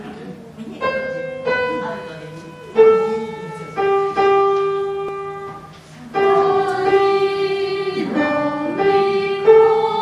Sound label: soprano; ソプラノ